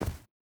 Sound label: footstep boots snow jump land; Rust